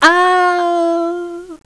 hit; BattleSNDS

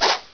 SWOOSH

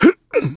hit; BattleSNDS

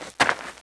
PRONE TO CROUCH

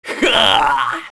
LAUGH; BattleSNDS

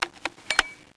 EJECT UNDERSLUNG GL

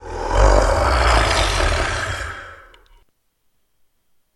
Zombie Raise

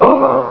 DYING; BattleSNDS

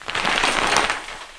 CURTAINS DOOR OPEN

